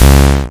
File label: lighting boom; opensurge